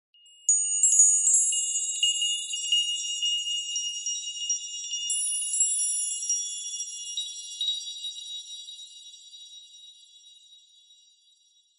fairy dust twinkle sparkle bell tree med high pitched; fantasy